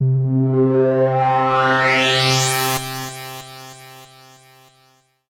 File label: message and ringtone